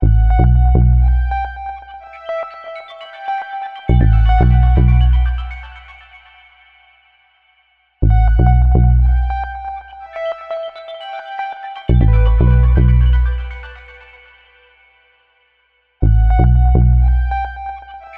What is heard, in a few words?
Korg Arp 2600 VST Ableton Loop